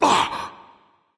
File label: nv surprised, vox; smite; voicepack; vulcan